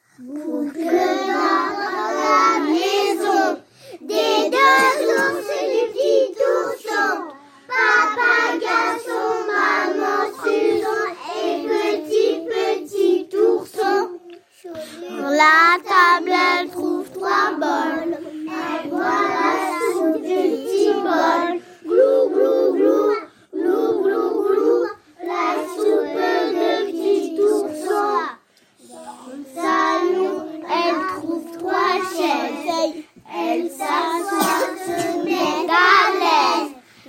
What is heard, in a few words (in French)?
Voix; 123 chantons